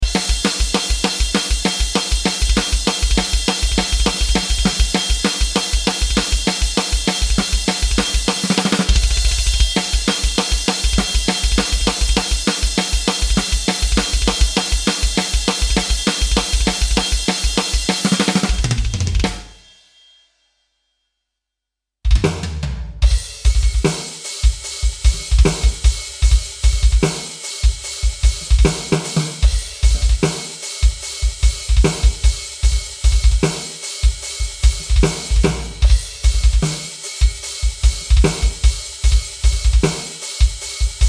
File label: metal